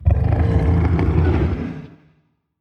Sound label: idle, Charybdis; Charybdis old